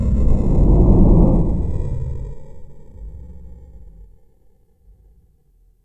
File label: Creature, Yamaha DTXpress Effect